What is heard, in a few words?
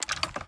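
Equip Movement; Valorant; ares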